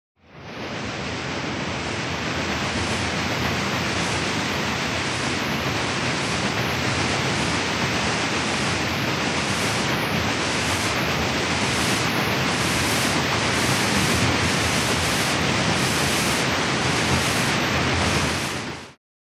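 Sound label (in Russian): paddle wheel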